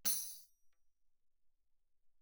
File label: tambourine normal mf; Percussion